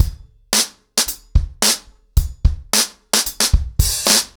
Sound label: 110BPM, ACOUSTIC DRUMS; The Roots Drumloops